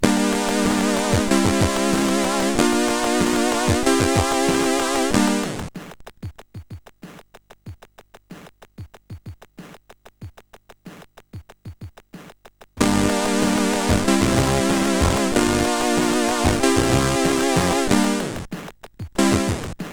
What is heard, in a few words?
Daring Dots, CSG8580R5